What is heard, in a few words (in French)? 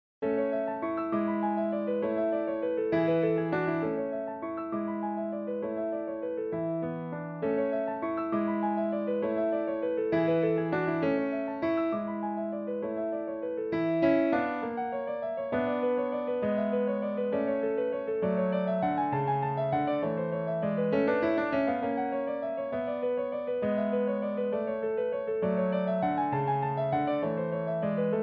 Allemande Pour Piano; Ludwig van Beethoven